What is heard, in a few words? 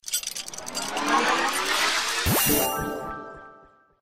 Box; Dr. Driving 2; Android